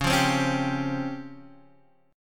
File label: C sharp Major 7th Flat 5th, C sharp, Guitar, Standard; strummed; chord; x,4,3,5,2,3